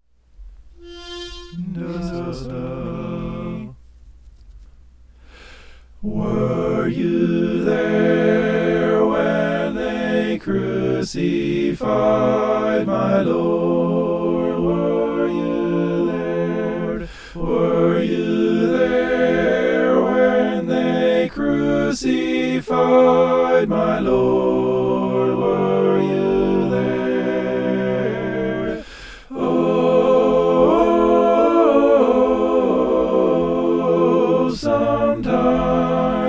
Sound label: were you there mixed